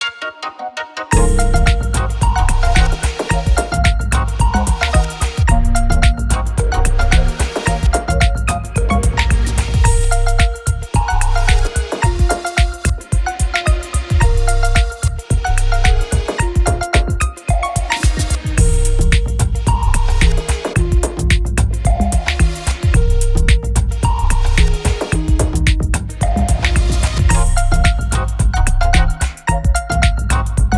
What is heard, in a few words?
Card Station; tribe nine; android